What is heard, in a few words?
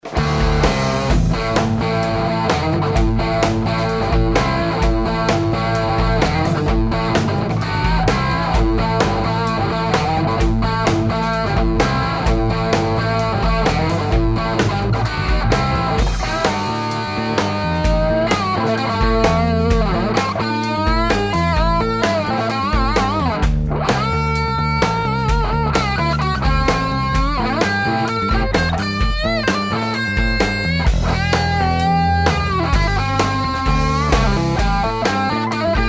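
higain bridge hot, guitar pickups; schwarzbach; VP-Tele-57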